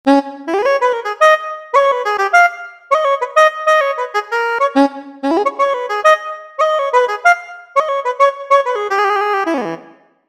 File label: Soprano Sax Stretch